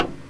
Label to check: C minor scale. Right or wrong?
Wrong